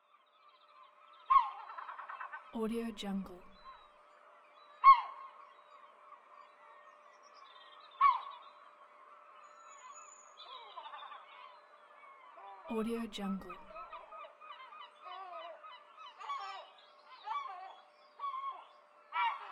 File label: seagulls